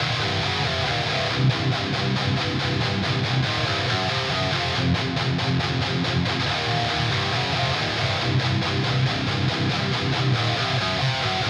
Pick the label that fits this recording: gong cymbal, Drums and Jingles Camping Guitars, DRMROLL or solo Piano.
Drums and Jingles Camping Guitars